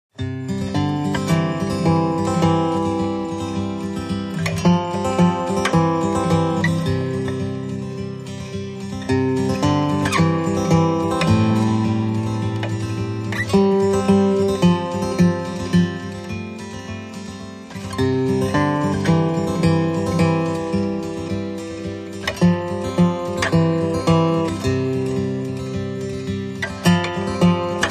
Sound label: No Glockenspiel No Banjo